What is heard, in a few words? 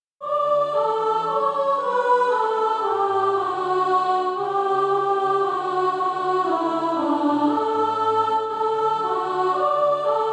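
soprano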